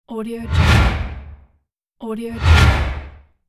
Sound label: whoosh